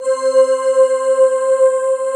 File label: AURORA, Casio VZ1